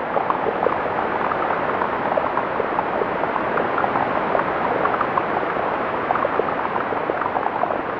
rain, ambience; timber

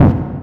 hit, sfx; rust

